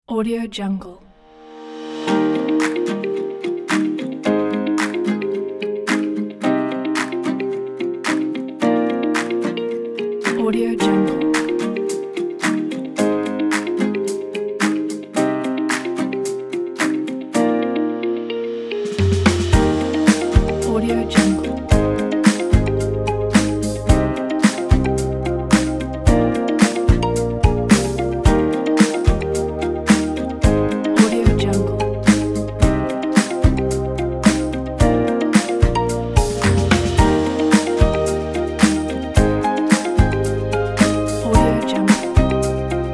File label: soft and uplifting corporate